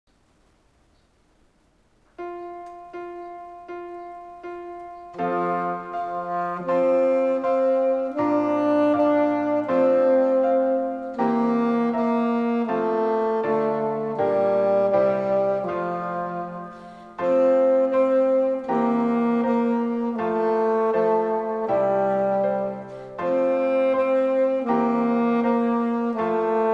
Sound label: Alto Sax Twinkle Twinkle; Suzuki Volume 1